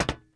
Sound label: metal box walk; blocks; step